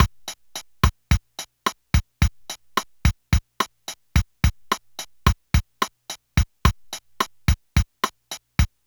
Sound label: Casio MT-600 Bossa Nova